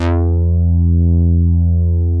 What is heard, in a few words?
CONCRETE BASS; Masterbits; Dance Trax